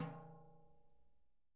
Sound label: Living Drums, JZ XTOM 1